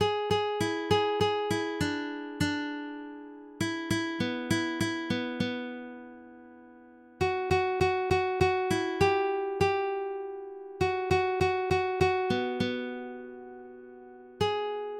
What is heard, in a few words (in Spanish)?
guitarra melodia